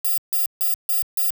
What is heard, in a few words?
alarm tone